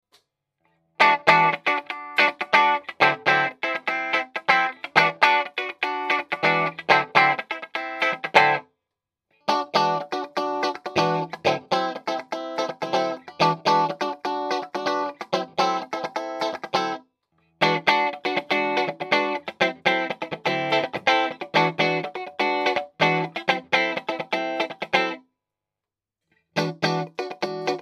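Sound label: strato clean; reverb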